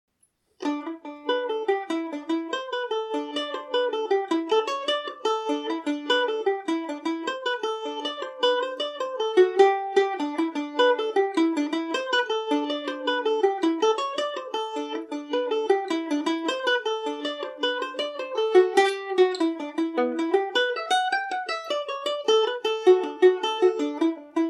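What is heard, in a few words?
workhouse g major, mandolin; The Workhouse; Falder mandolin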